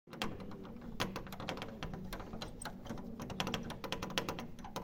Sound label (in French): cles